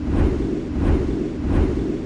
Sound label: fan; CounterStrike; cstrike